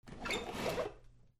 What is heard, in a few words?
HOUSE